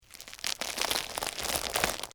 Texture, Crunch, Footstep, Grind, On, Ramen, Noodles; Crunch Sound Effects; Blast Wave